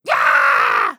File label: Minion Attack